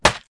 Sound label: bod strk, bugs; Starship Troopers